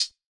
Hat, Closed Hats

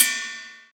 ride trappy, Cymbals; Crashes & Cymbals

